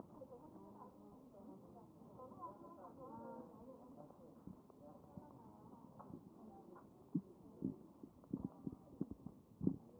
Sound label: chest